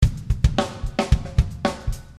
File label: Funk Groove Drums Loopable